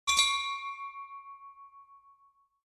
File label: Traditional shop doorbell chime